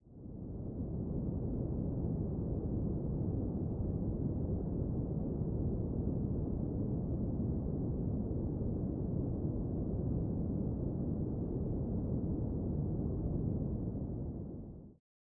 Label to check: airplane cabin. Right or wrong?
Right